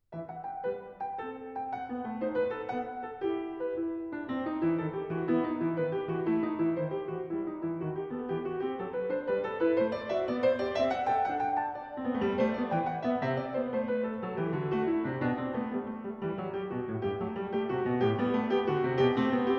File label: Partita no. 6 in E minor, BWV 830 - Tempo di Gavotta